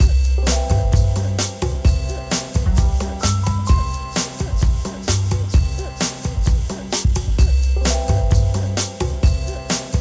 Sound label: bass; latin